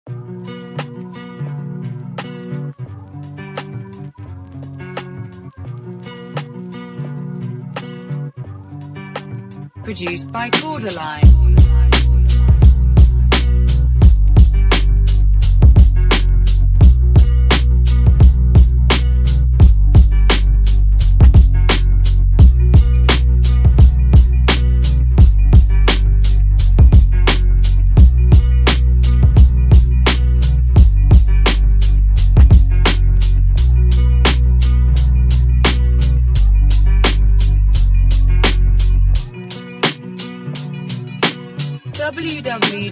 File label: Baggy Beat